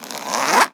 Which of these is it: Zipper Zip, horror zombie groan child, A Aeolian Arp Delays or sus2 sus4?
Zipper Zip